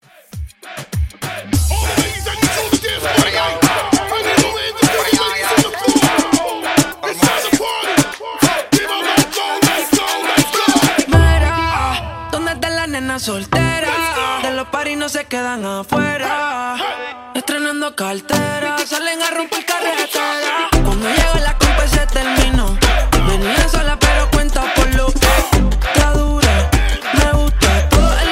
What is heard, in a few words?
Moombaton, 100